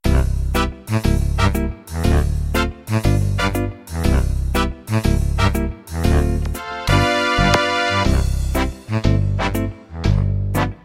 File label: Big Band; mzx500; preview pack 01